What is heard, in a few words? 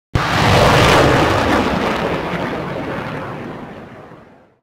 sa6 sam site; civ13; civ14; Vehicles